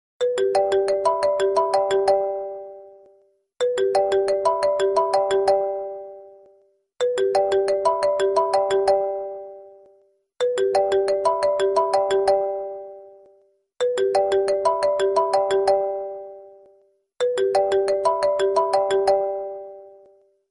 iphone ringtone

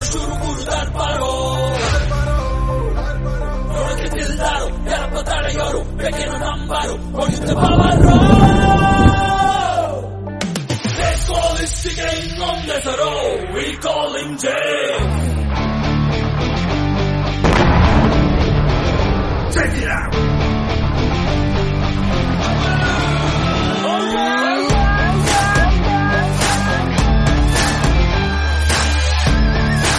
kannada song; james

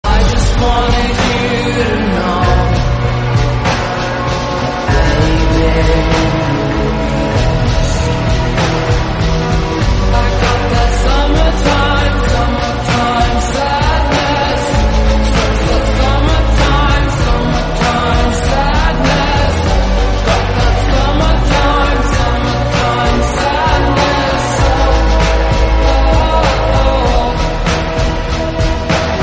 slowed, english song

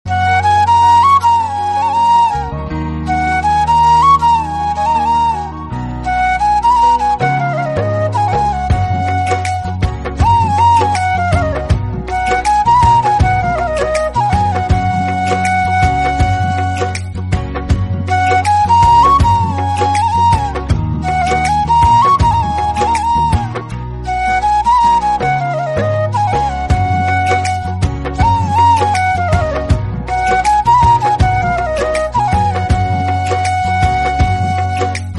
instrumental song

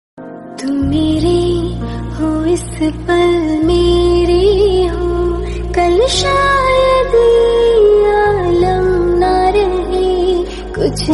female version

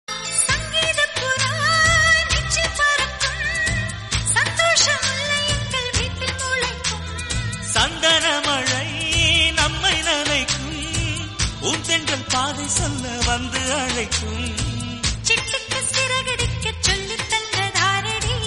song

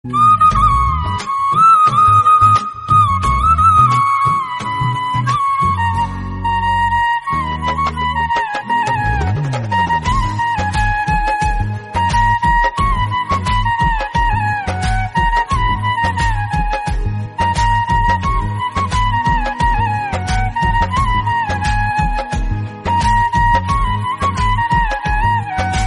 flute instrumental

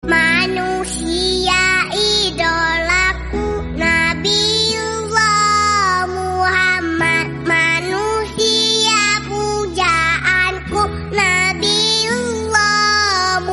Arabic song

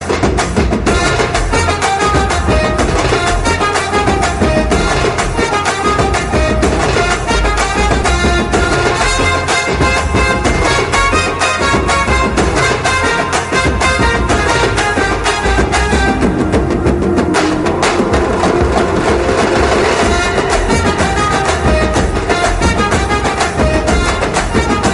bgm, tamil; viruman

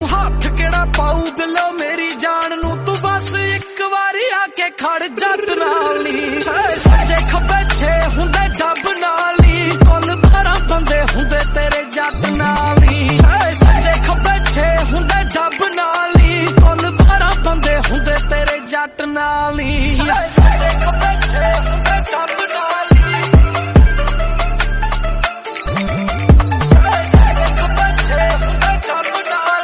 punjabi song

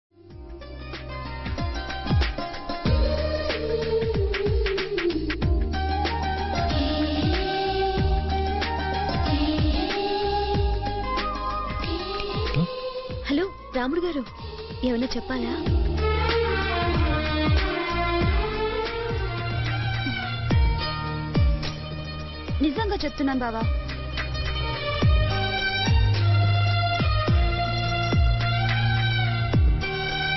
melody bgm telugu

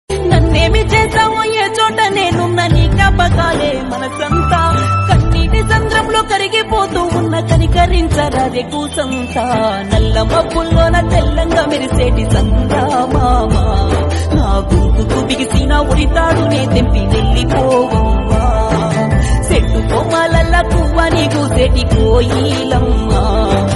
telugu song

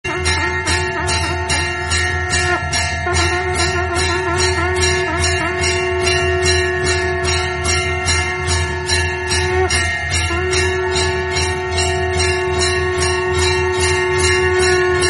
devotional instrumental hindi

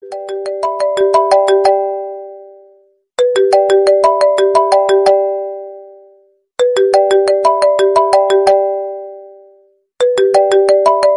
Iphone

